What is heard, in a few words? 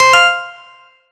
Winter games